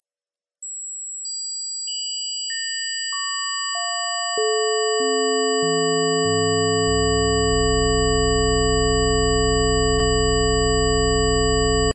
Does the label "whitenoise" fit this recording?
Yes